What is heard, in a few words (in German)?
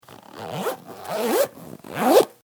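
Etui Reißverschluss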